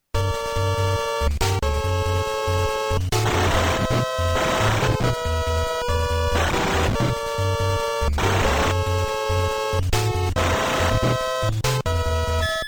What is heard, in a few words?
stage 5; double dragon